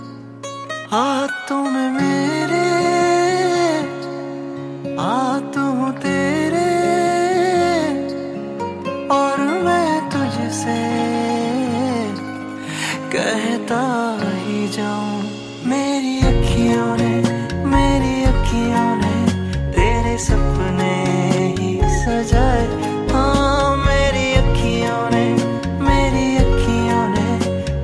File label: Punjabi Ringtone